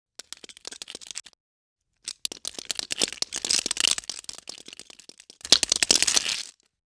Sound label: Single Bug Insect Fs Fast; Fairlight Sound Lib